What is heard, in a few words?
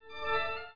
menu popup